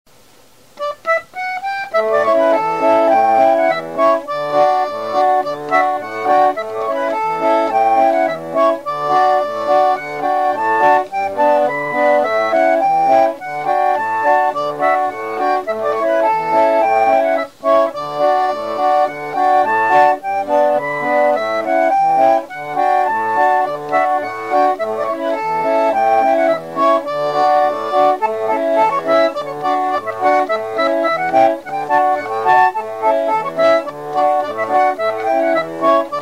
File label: Farm Road Polka, concertina